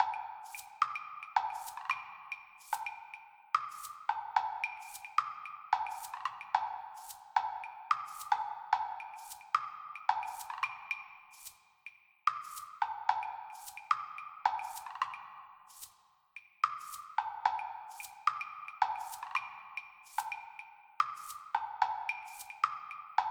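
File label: percussion; Toki Tori 2+; Toki Tori Collection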